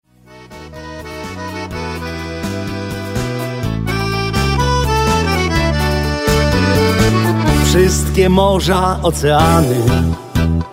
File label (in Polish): kapitanskie tango